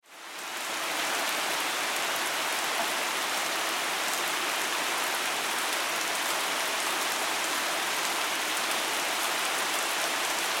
Weather Rain Pour Ambience; SFX Bible

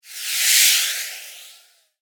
Fire Flare Pass By, Science Fiction; Spacecraft

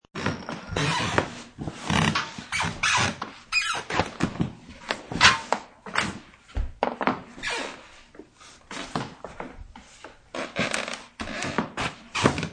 Walking on a creaky creepy floor.; Footsteps on Creaky Floor 1; Human

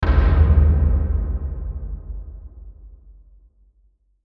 Hit Drum Low; Hit Drum Low Powerful; Blastwave

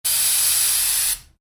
Spray Can: Spraying; Objects; Stuart Duffield

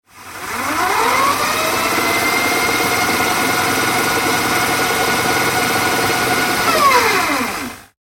household vhs fast forward or rewind noisy; Vhs Recorder Rewind Or Fast Forward Tape; Electronic; Miscellaneous Electronic; Electronics; McKinney Sound

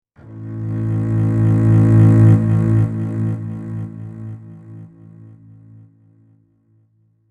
Cello chord transition in A; Dark Cello; Strings

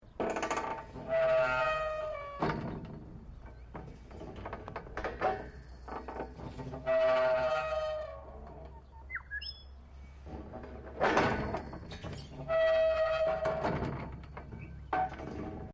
PLAYGROUND METAL SEESAW OPERATING LOOP

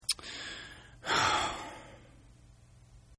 Sigh; Exhaling; Sound Effects:Voice; Partners In Rhyme

